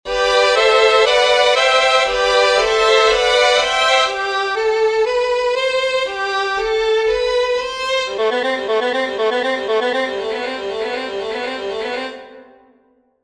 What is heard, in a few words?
Legato Test Violin